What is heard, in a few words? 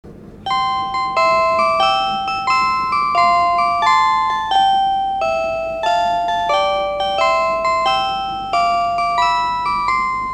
chime; hokkaido